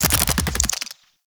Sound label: Infested Big Ashen Melee Start; Warframe